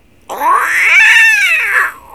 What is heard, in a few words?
cat howl